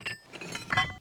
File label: reload